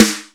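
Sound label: Roland R-8 funk snare; Power Drums U.S.A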